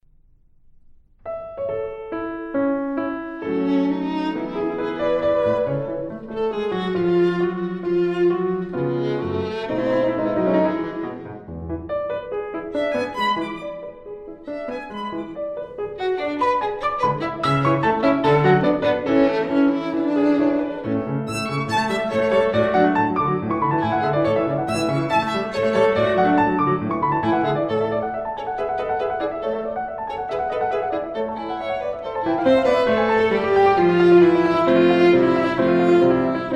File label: Piano Violin Sonata A Major Allegro Moderato; Woelfl; Op14 No1